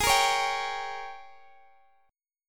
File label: Ab Major 7th Suspended 2nd Suspended 4th, Guitar, DADGAD